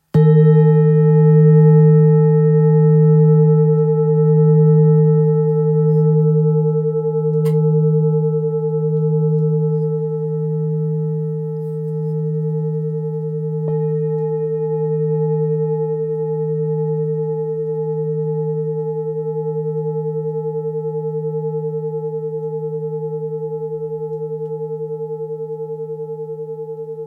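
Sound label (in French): Fullmoon grave re diese, bol chantant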